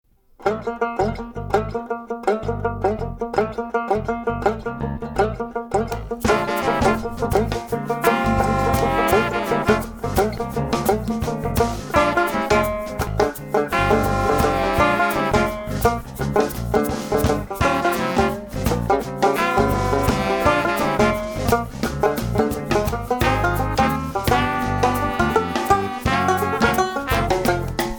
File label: Funky Banjo